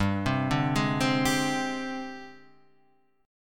G sharp Major 7th Flat 5th

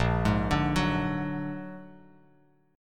Bb Augmented 7th Bb down Bass Standard; Bb+7; arpeggiated; JGuitar

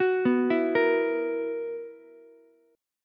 B Major 7th Suspended 4th B down Ukulele; BM7sus4; arpeggiated

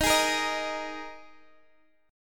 D sharp Suspended 4th D sharp; chord shape

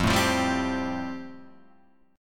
F Major Add 9th; Fadd9 strummed; JGuitar

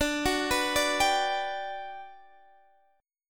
G Major 7th D

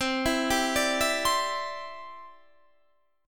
C Major Add 9th, Guitar, Standard; arpeggiated; JGuitar

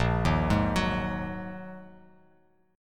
Bb 7th Suspended 4th Sharp 5th Bb Bass Standard; Bb7sus4#5; arpeggiated; 6,6,6,11; JGuitar

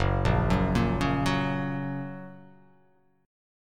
Gb Major; Gb arpeggiated; JGuitar

